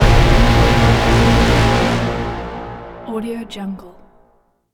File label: braaam strike; esmteam